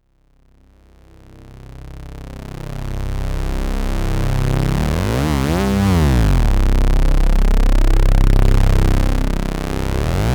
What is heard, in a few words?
MemoryMoog Unison Glide